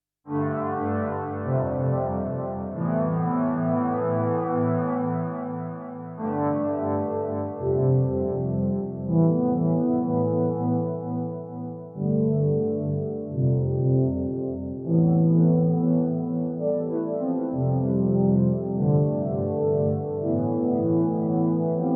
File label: MICROKORG; Detroit style 5th space bliss; Synthstuff Korg MicroKorg